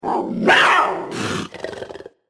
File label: POUNCE WARNING; thefinals